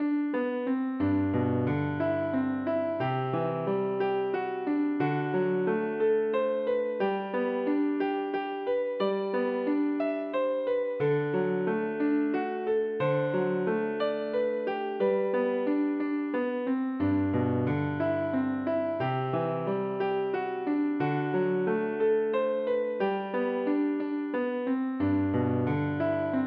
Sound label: piano